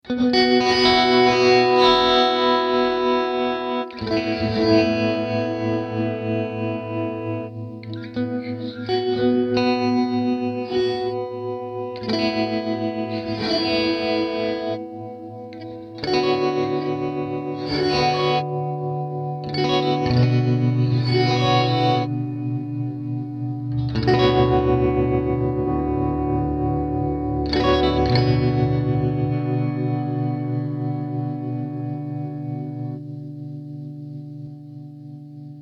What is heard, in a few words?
Guitar Slower; M4L Bulbs; Rene Margraff